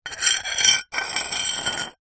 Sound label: household broken pieces ceramic dish edges scrape close